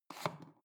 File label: foot switch dimmer slide up